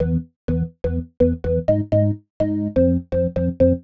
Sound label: Organ chops (125BPM), retro house